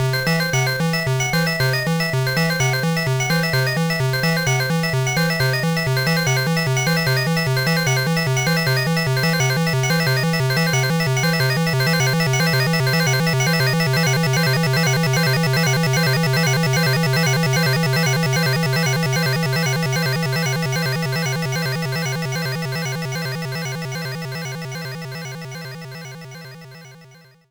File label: In Game; Pac-Man 99; 10 Left; NAMCO CLASSIC 5